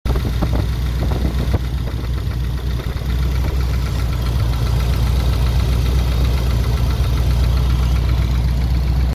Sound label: engine ticking engine tick; c3 tech performance